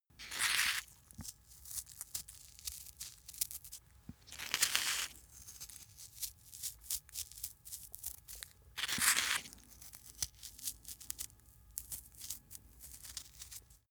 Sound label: small animal eat crunch